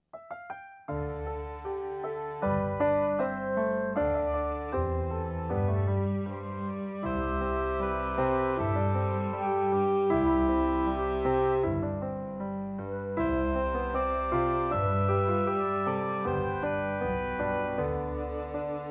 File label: A Star Shines Bright accompaniment